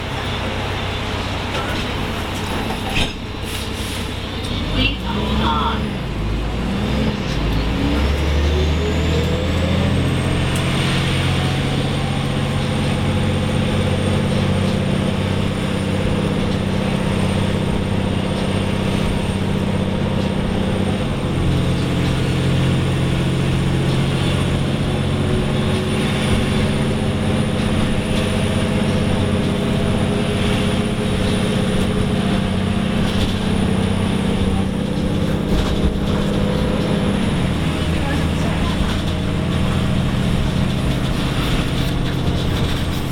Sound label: Muni Bus